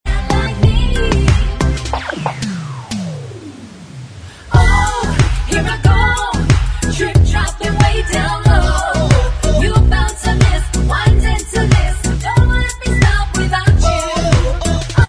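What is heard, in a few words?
backing vocals track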